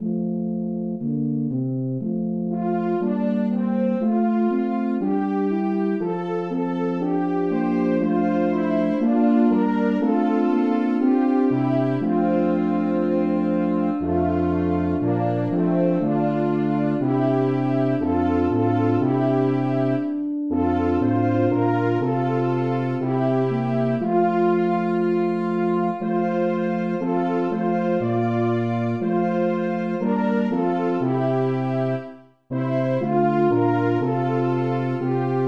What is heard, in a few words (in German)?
sopran